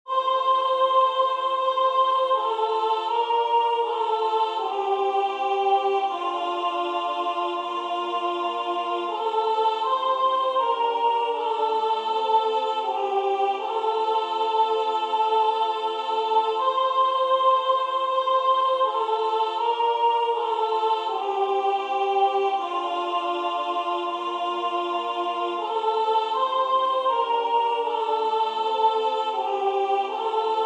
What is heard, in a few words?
soprano practice track; lo how a rose